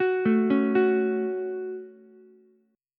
C sharp Suspended 4th Sharp 5th C sharp Ukulele, chord; arpeggiated